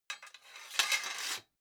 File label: sword sabre sheathe slow